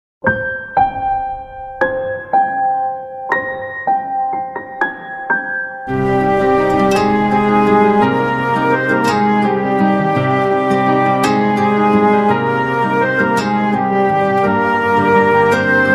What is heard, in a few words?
Ringtone